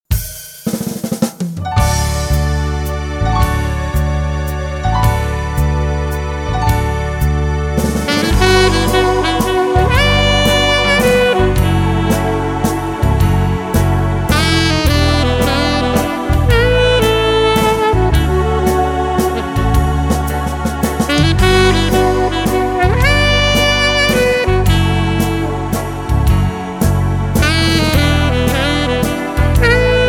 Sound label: waltz